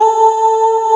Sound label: DIDI PAD, SYNTHESIZER